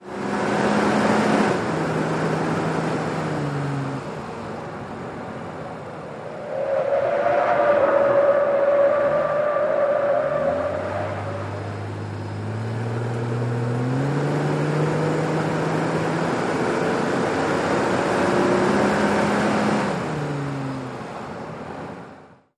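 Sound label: SUV Truck Accel Corners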